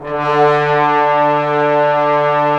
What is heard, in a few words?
BRS Tbns; Roland - LA Composer vol. 4